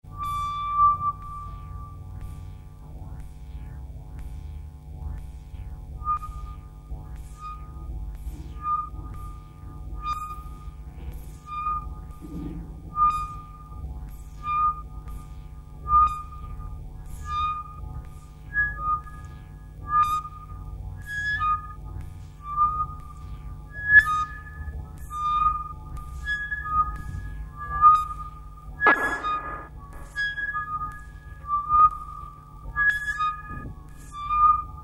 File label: live signal processing; Vole